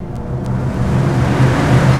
reverse; Techno Trance Essentials; FX & ATMO

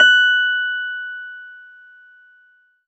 CLAVI, Disco House; Best Service Dance Mega Disco House; CLAVINET

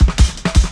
Shuffling Live Cut, Jungle Drum Loops; Zero-G Jungle Warfare 1, 2 & 3; 90 s Sample CDs

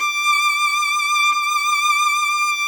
VLN D5; Sonic Reality; American Heartland

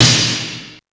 SD2 M1, DRUMS; East West; Global Sounds

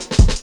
Fill, Programmed Stepz, drum loop hits; Zero-G Jungle Warfare 1, 2 & 3; Percussion Hits

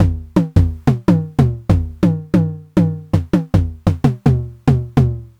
909 Tom Mid

